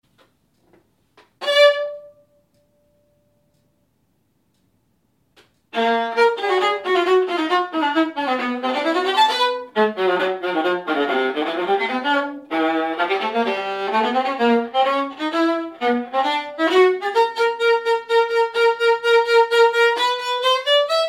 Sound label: Viola; Shostakovich